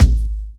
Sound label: prominent kick single hit g sharp key; kick drum